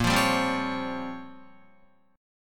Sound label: Bb Minor 6th Add 9th Bb down Guitar; strummed; JGuitar